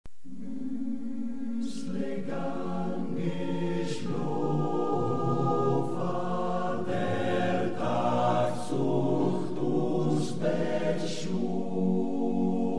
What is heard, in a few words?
Schlofliedele coro; Valsella